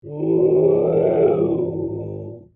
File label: roar viking